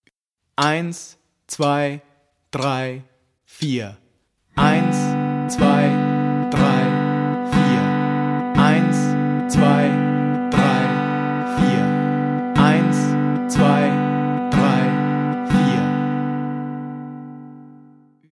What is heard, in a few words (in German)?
Uebung, gitarre; Dafür werden die 12 wichtigsten Akkorde zunächst einzeln und in einfachem Rhythmus geübt.; Garantiert Gitarre Lernen